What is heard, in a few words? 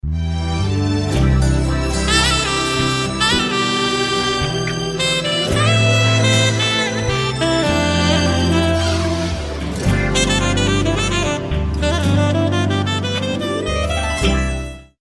classic trumpet; classical